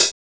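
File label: DRUMU closed hi-hat 2, DRUMLATOR; MACH.HATS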